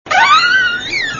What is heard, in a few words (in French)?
texture grincement de porte